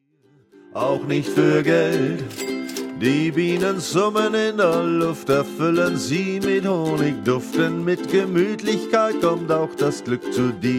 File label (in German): ukulele gesang